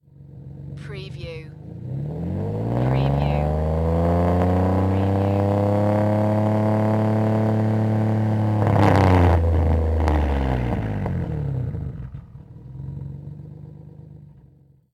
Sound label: NISSAN 350Z EXHAUST LONG REV